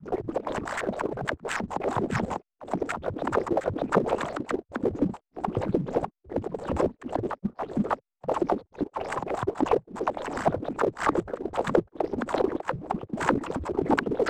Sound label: BUB, LAVA ATMO; Total Control - Action Cutz